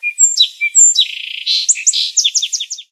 Sparrow, ringtones; HTC Rhyme

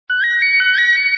sms; Celkon; A88

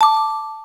Shutter

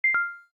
cam stop

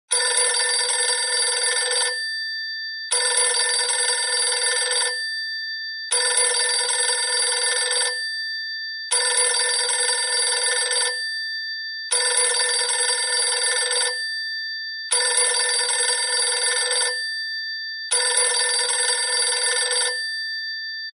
Telephone; Samsung SCH-R220 Jitterbug Plus

